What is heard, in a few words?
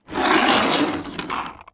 Foley Toy Roll